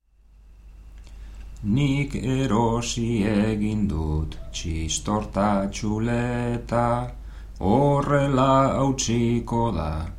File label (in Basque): bertsos